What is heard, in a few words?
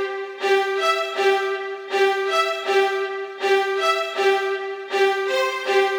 Classico, ambient